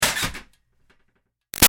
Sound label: Ironing Board Metal Fold Up Screech Fast; Miscellaneous Household; BigRoomSound